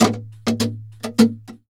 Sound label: flamenco guitar, duende fx